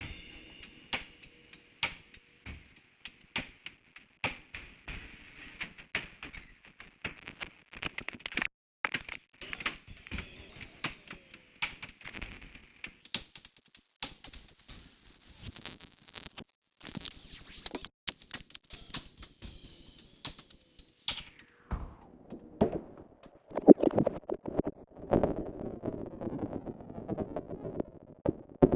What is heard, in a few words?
Delay Filter Sample reduction